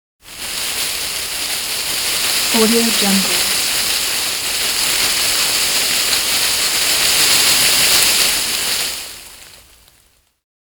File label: rustling foliage